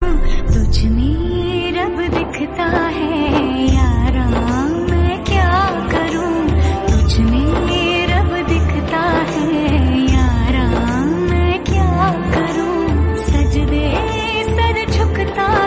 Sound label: Female Version Ringtone